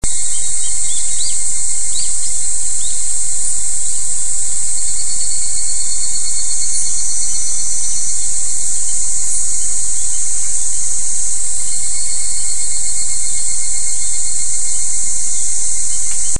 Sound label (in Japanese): higurashi